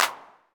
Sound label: Clap 909; Drum Machines; Drum Hits; Ableton